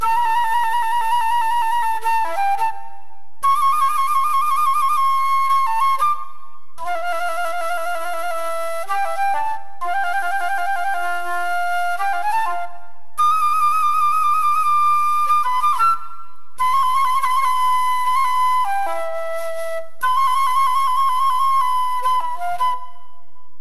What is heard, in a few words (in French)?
flute traversiere